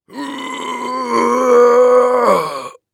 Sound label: Human Male Strain Grunting